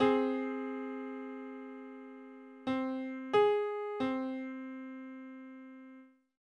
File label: Minor sixth on C